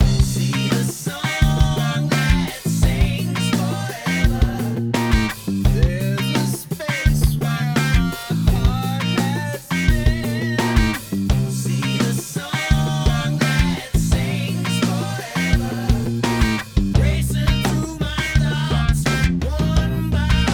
Mix Gtr Mic Vocals Mic; uad la 6176 channel strip

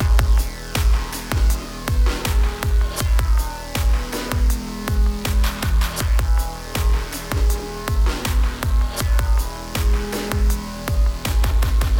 Drum Synth Dry; acustica audio dove

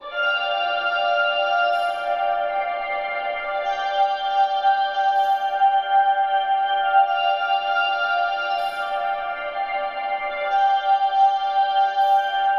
Synth Tempered; eventide temperance pro

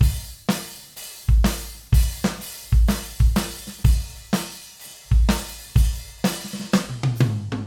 Drums Reverb; universal audio uadx sound city studios

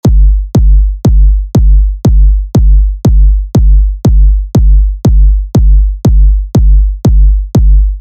DRY, kick; United Plugins Dropkick, lo scultore per kick; Shorten

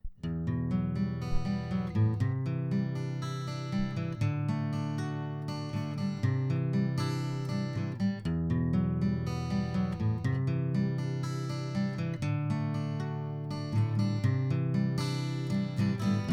Guitar Figure 8; Austrian Audio OC818

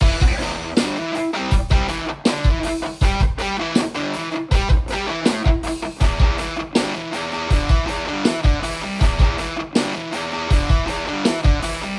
Drums Gtrs Re Mic; universal audio uadx sound city studios

